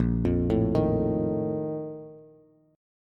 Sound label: Bb Major 7th Suspended 4th, Bass; arpeggiated; JGuitar